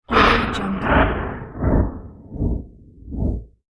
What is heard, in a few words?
spiraling space beacon